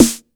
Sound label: HOUSE DRUM SD; AKAI S6000 CD-ROM - Volume 1; DRUM RYTHM